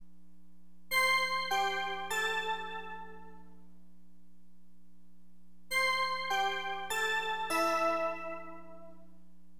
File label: BELLS, Keyboards; LiveAct Pool; AcidJazz Funk